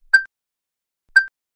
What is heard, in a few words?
2 Beeps; NookPhone